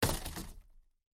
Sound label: impact; The Loud House - Extreme Cardboard Racing; NextlevelGames